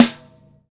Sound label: TY Snare, Roland BK-5; Snares